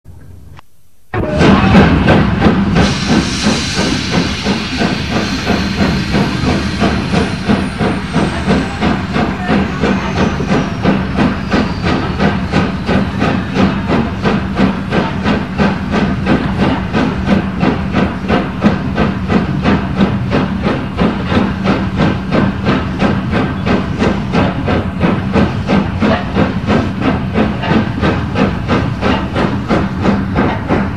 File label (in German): Luftpumpe, eisenbahn; alsdorf